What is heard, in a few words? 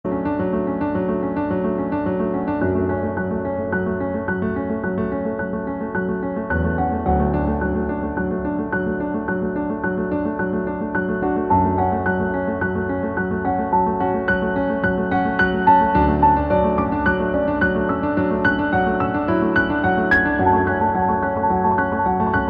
Piano